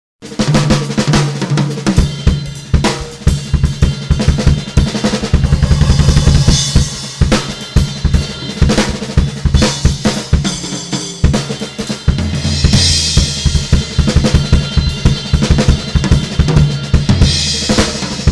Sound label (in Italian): assolo